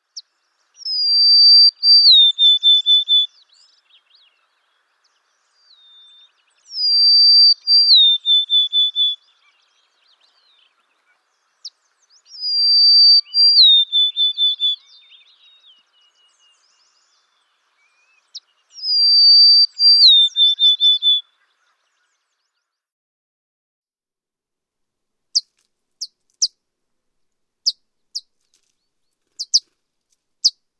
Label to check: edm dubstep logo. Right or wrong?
Wrong